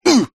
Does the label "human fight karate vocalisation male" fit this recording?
Yes